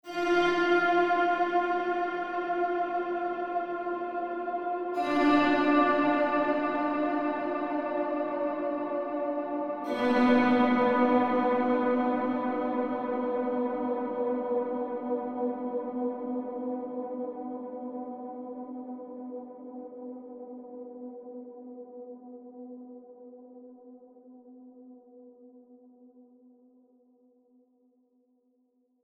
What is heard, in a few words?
Scoring Guitars Pads; Through The Singularity